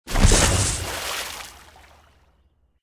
gungnir charge; smite; god odin

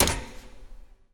movement fire land; smite; Arachne

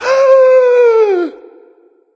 breath; smite; loki

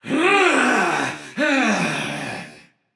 battlecry, voicepack; smite; jorm

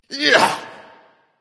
grunt attack; chronos

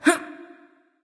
grunt lift; smite; voicepack; skadi

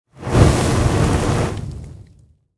lobby victory fire; smite; god daji skin15

